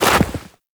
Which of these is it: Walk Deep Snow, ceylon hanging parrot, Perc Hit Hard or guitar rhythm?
Walk Deep Snow